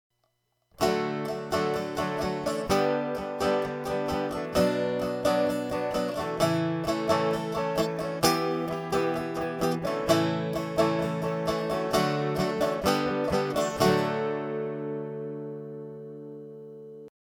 D Guitar

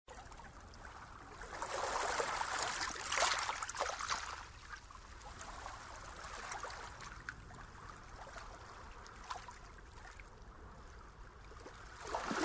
Gentle Surf

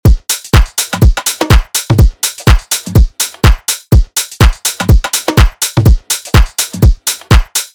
House Drums

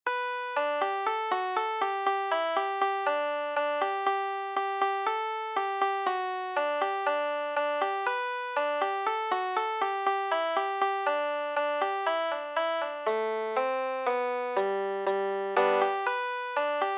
jawbone banjo

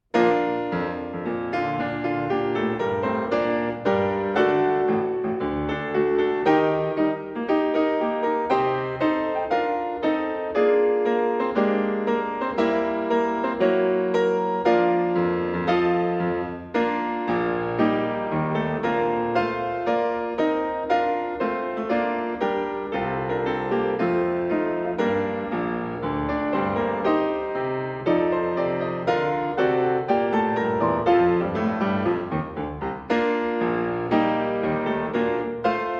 march

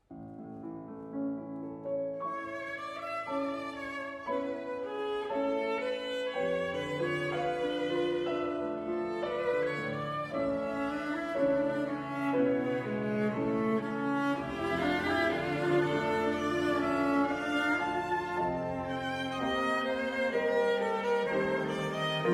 Piano Trio in A Minor

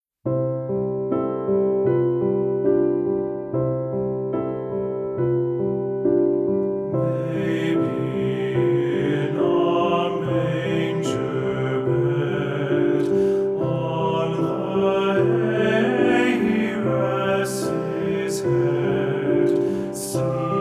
Christmas Lullaby SATB

